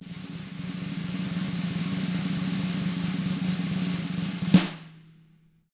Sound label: roll, snare; ILIO; Double Platinum Drums 1